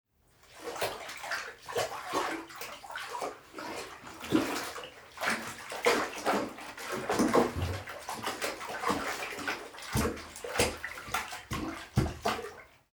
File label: splashing side